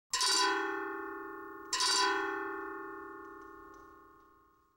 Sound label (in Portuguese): raspado no hang